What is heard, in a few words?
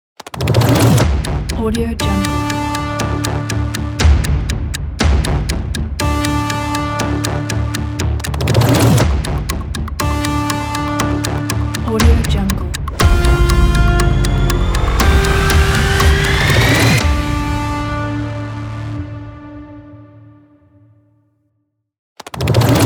trailer countdown logo